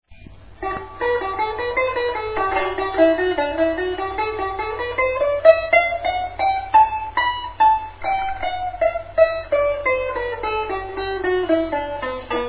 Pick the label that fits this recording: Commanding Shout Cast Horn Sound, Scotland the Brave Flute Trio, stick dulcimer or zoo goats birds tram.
stick dulcimer